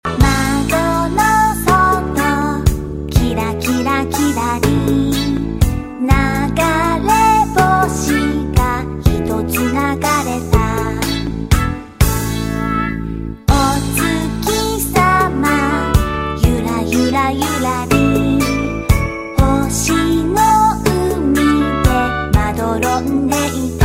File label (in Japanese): Cute Japanese Song